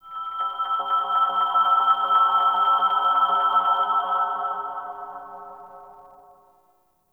Clan Dojo Research, Ambience; Warframe; Dojo Randoms

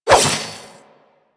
Nikana Swing E; Revenant

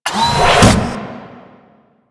Animal Foundry Sleep To Working; Tenno; Warframe; Liset; Hub

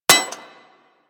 Bullet Impact Generic Metal; Surface

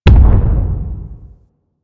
Arachnoid Camper Distant Step; Warframe; Corpus

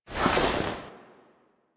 Foley Wake Up; Cinematics; Tenno; Warframe; Orbiter Cradle

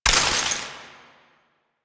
Ammo Pickup Shock; Ammo Ability; DE; Warframe; Frumentarius

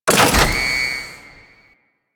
Asteroid Field Console Activate; Warframe; Ambience; The War Within; Ship

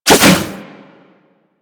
Briefcase Scythe Spin Whoosh A; Crp Briefcase Scythe

